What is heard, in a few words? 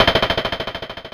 Synth Perc; Dream Zone